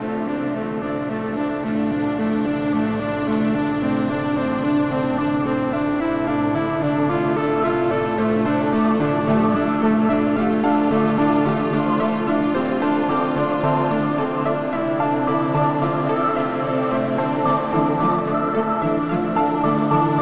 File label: LOBBY LOOP; escape academy